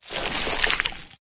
human form long move; flood

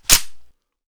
ots33 slideback

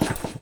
saw stop, circularsaw; weapons

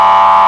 horn; vcmod; els; e-q2b

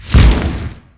flap, dragon

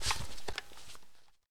generic cloth medium, weapons handling

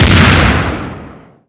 double shotgun fire

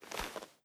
iron out; weapons; bf3

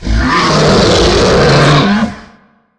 roar, dragon

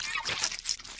infector idle; flood

